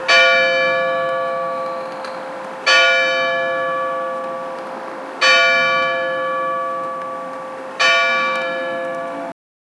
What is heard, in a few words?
church bell